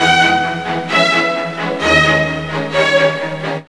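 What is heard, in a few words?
string riff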